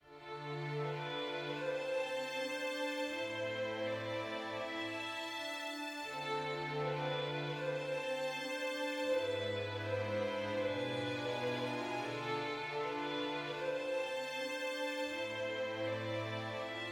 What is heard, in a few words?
sad med